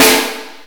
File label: clicky acoustic snare sample a key; Channels Mono; snare-drum-samples